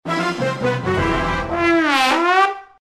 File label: Music Race Lose, soundtrack; Ty the Tasmanian Tiger 2 Bush Rescue; PS2